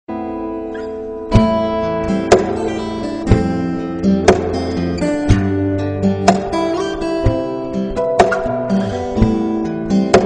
sunflower guitar

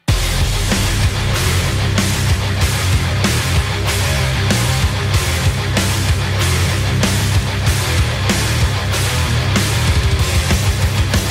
rock ringtone

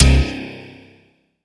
Vibrate Hit

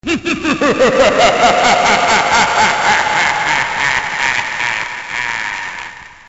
horror laugh; ringtones

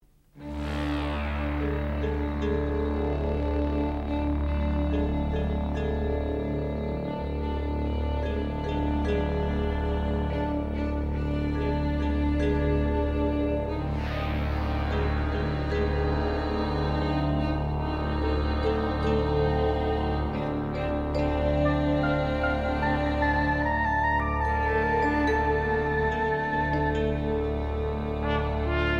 dramatic open

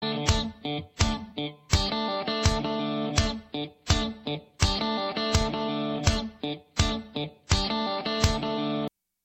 space guitar

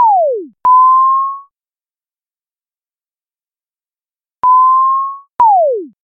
SMS

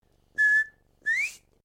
Quail Whistle

